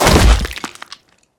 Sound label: ice fall; Golden Crayon Sounds